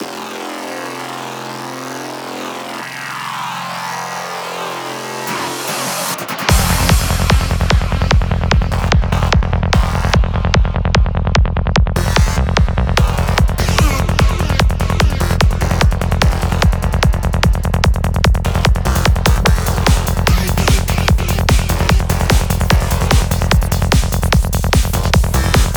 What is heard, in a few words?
trance, music dance; Workers Trance Party of North Korea